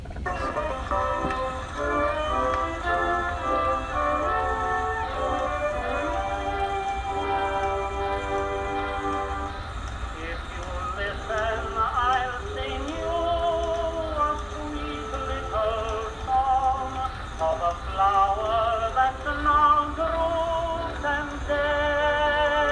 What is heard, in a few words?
Edisons gramophone